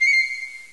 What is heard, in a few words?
pipe